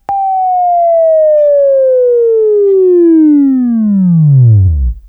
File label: WAV Left, Sweeping; Sweeping Training program